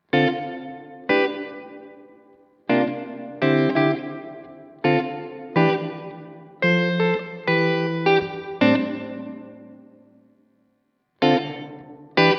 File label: uafx golden reverberator